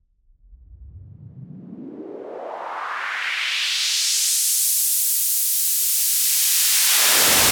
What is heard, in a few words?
Raise Clean